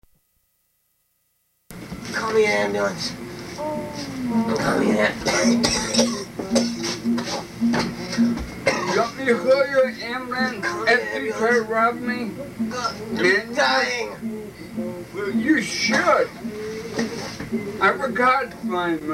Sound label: ambulance